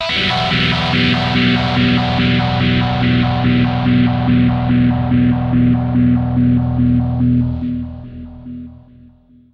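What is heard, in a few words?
dirty guitar stereo polyphase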